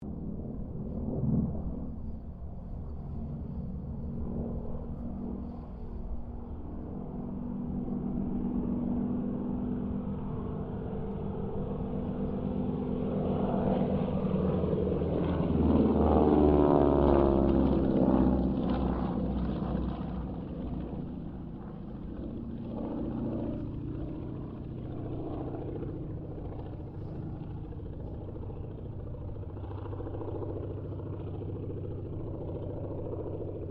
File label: AIRCRAFT CT4 WINJEEL WIRRAWAY HARVARD PASS; Mixed aircraft squadrons; Airplanes